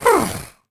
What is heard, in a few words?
goblin sleep; Crypt of the NecroDancer